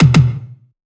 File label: tom dubber; Drum One Shots; Slate; SD MIDNIGHT Sample Pack